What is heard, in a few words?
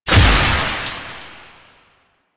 Projectile Death Tech Medium; Weapons; Warframe; Duviri; Incarnon Adapters